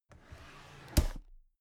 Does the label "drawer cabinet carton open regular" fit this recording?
Yes